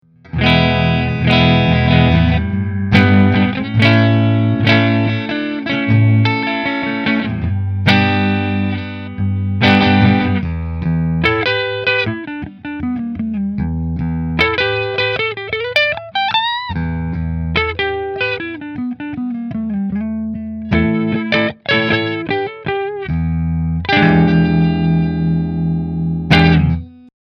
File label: Nola JB14 Red Neck Fender